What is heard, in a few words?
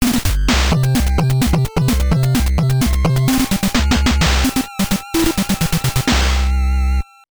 Congratulations!